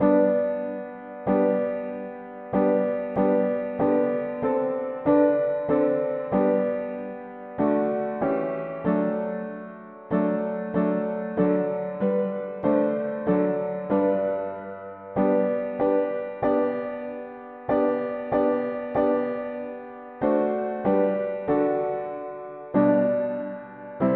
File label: mono piano; hymns; How good is the God we adore